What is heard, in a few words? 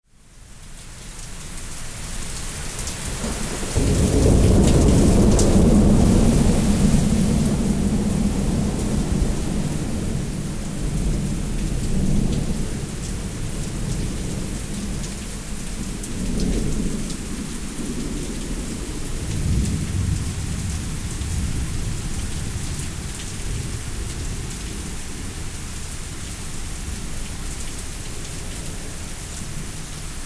Thunderstorm, Field Recordings